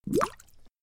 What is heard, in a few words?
magic drop